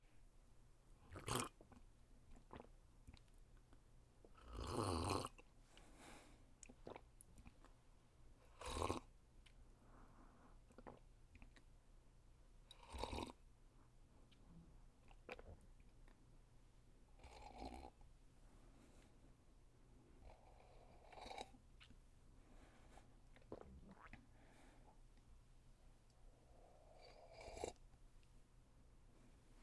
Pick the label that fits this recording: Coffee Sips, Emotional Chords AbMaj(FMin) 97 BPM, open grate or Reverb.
Coffee Sips